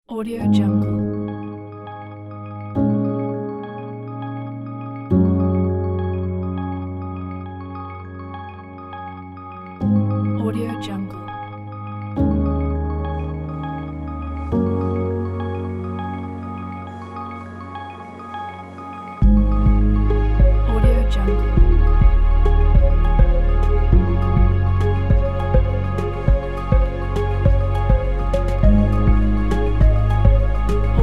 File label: abstract indie ambient; redbeet